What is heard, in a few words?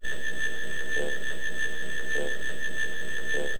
SCAN